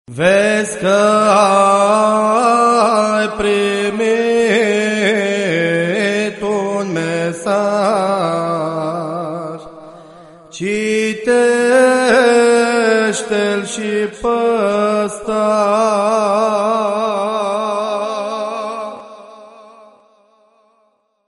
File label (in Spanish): alerta mensaje